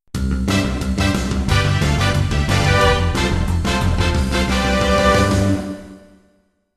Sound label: Stage Clear; Riding Fight